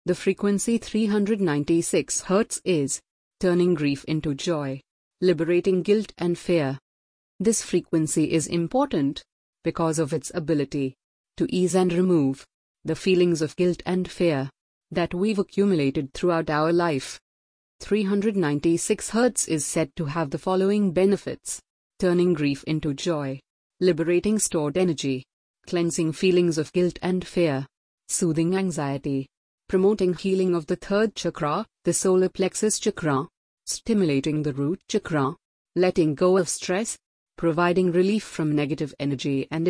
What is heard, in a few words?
396 Hz; Turning Grief into Joy Liberating Guilt Fear